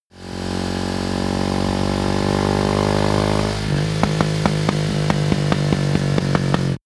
motorcycle motorcross decelerate slow acceleration